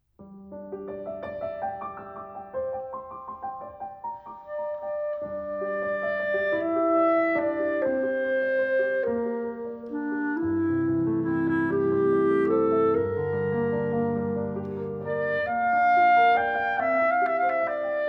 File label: Idylls for Oboe Clarinet and Piano